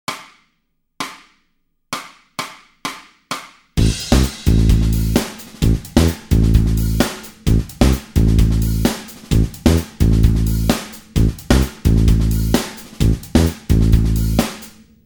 backing track

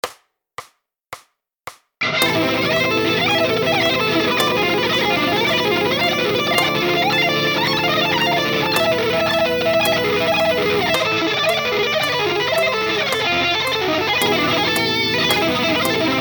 sweep etude

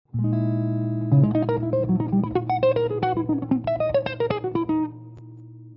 Locrian nat. 2 Jazz Lines, guitar

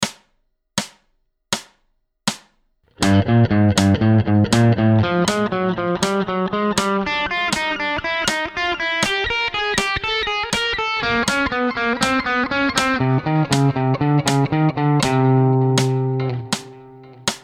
slow 80bpm

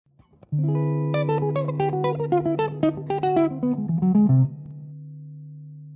Lydian Dominant Lines

